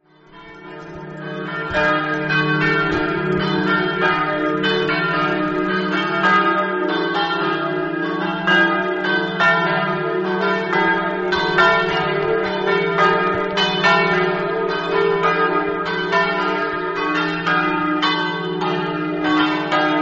flagogna, campane

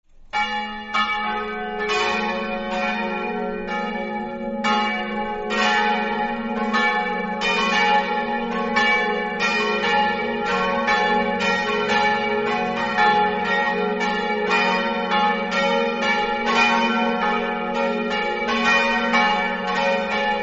colle, campane

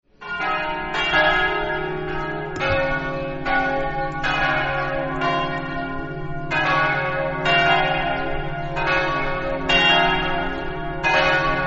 campane, Osoppo

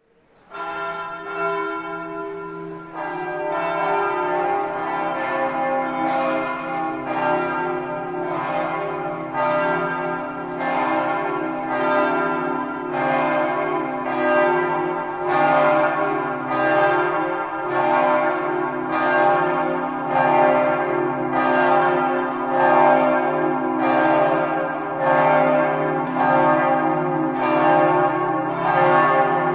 cividale, campane

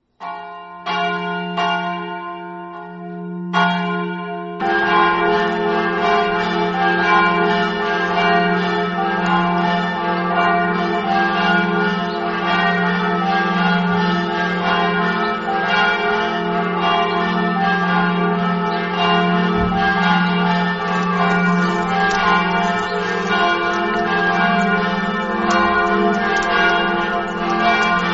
budoia, campane

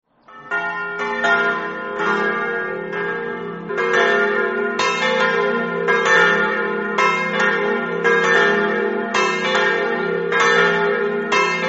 pignano, campane

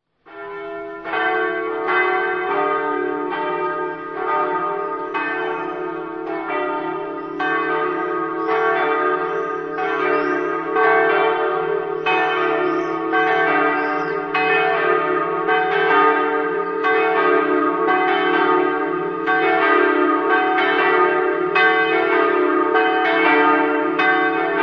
aviano, campane

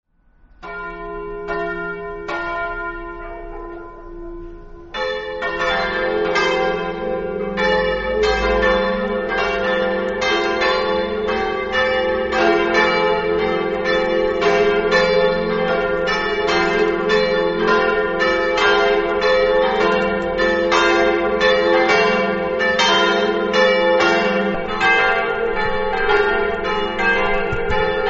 rodeano, campane

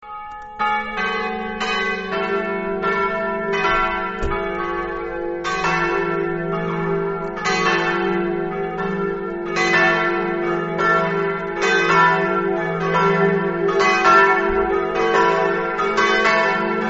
ipplis, campane

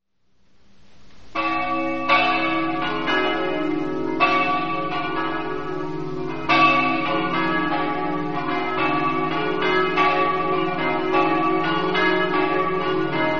campane; zuian

